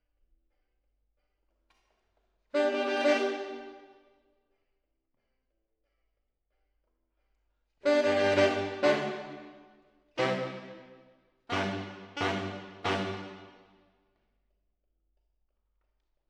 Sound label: MKH AB, piano